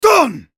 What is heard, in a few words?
spellcast effort; Yasuo; en US